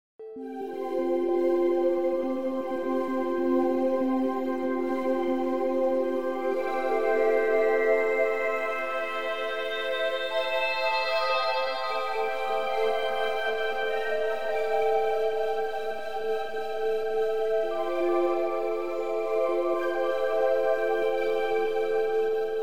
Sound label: Wind Harp; MusicoftheWind